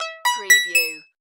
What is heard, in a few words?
Multimedia Chime